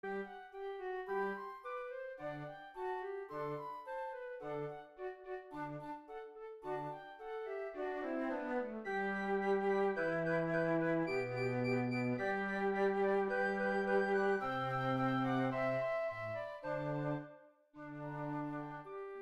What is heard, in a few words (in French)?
ensemble de flûtes; Les jeux de Gargantua